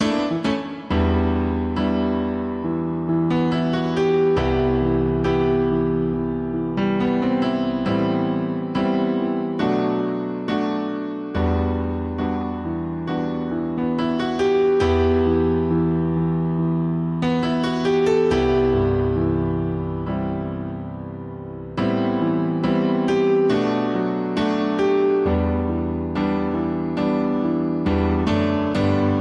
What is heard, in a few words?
Only A Memory, Piano